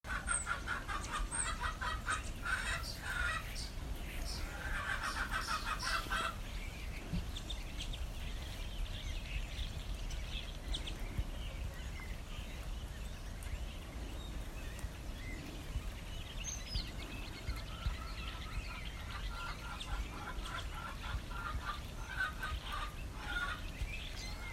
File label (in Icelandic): Umhverfishljod